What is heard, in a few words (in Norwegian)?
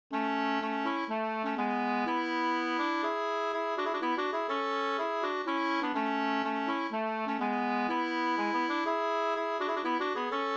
pols; Fersdalingen no 2st